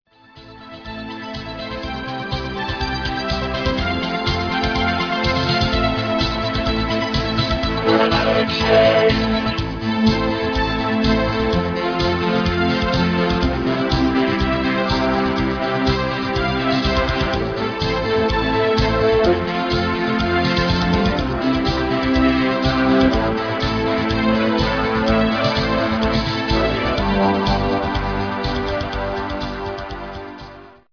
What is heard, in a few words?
Voc